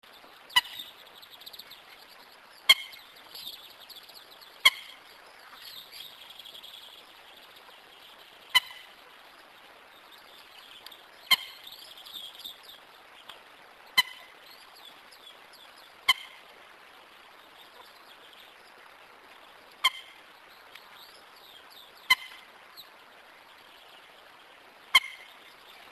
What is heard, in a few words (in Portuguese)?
canto da galinha d agua